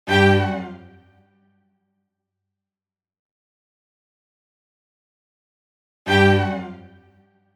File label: Orchestral Hit